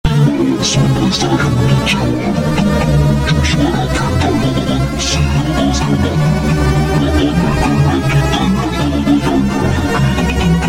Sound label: Hardbass over Slowed